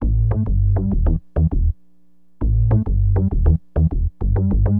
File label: SYN BAS, Acid Jazz Funk